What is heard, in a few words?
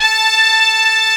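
brass, C4; Best Service Advanced Media Trax Modern Composer; BRASS MIX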